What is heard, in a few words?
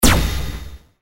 interstellar gun